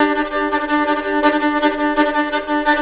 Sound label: violin; violin slayer